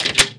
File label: glass cabinet; sindemo; environment